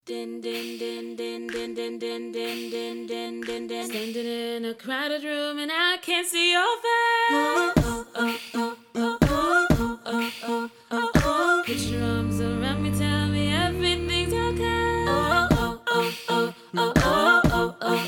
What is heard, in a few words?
voice, acappella